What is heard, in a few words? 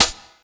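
snare drum, 145 BPM, Drum & Bass; Ueberschall; Drum & Bass Flash Trackz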